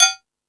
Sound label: Space Drums, Drum Machines